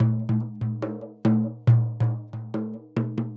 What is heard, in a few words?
Frame Drum Patern